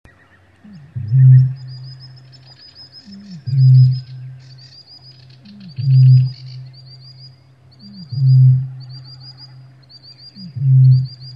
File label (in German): Ruf einer Rohrdommel; Eltern-Kind-Gruppen (Klangwiese); bergische musikschule